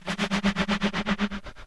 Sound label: Dusty Trem Trumpet G2